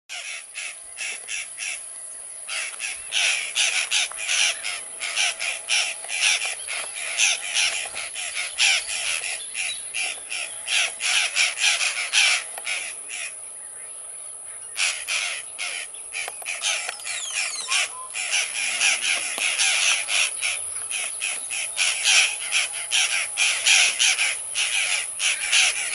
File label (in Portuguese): Canto do gralha violacea